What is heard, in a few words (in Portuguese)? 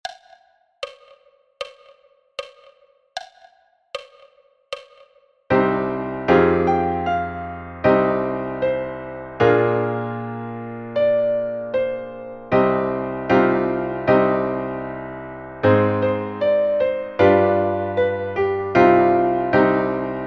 Coro Fração do Pão; Alunos do Modulo II